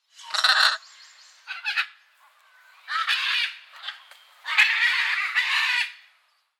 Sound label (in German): Ararauna Ara ararauna; Vogelstimmen